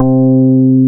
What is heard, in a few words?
BAS MKS-50; Best Service Gigapack 2